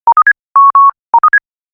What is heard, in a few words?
Melodic Morse SMS